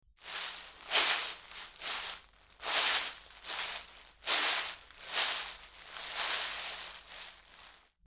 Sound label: Leaves Footsteps; horror; horror sfx vol 1